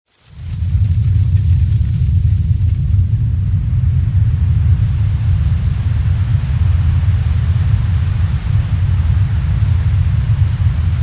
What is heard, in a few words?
Desert Tower Open Sand Released Long Stream; Journey